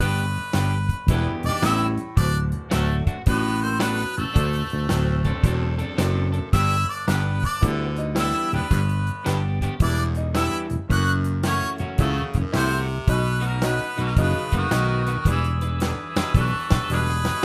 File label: ball game